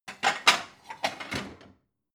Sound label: plate multiple shelve